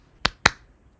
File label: clap